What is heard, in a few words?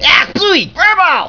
furball, cat sounds